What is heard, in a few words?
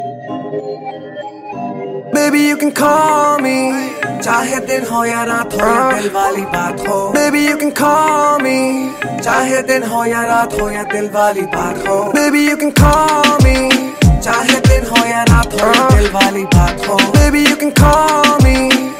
ringtone bollywood ringtones